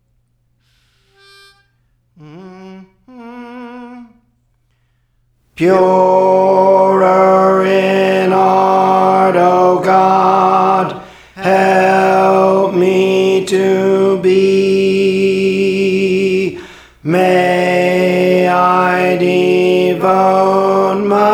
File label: Tenor, Praise For The Lord Training